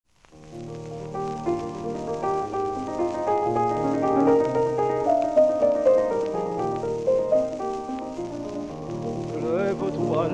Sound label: hahn chanson